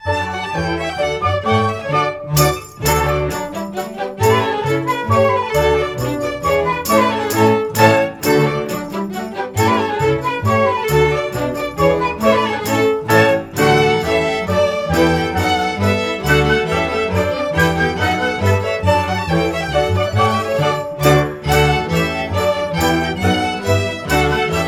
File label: Fist polka; SIFD CD1